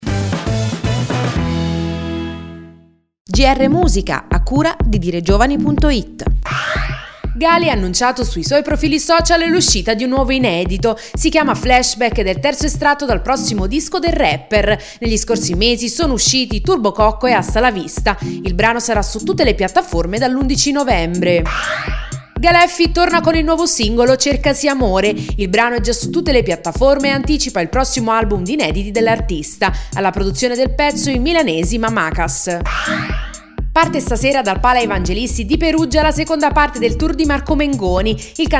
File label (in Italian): musica